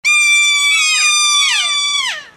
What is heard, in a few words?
Kea